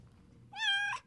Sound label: meow